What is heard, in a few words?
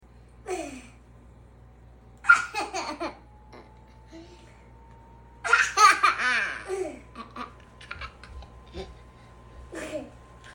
laugh